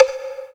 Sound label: percussion special; Ueberschall; Techno Trance Essentials; DRUMS